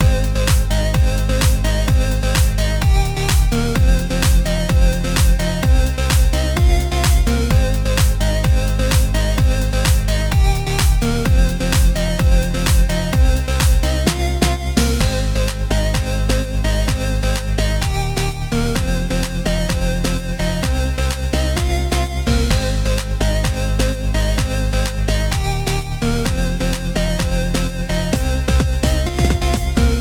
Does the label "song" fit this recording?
Yes